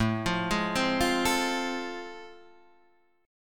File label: A Minor Major 7th Sharp 5th; AmM7#5 arpeggiated; 5,8,6,5,6,5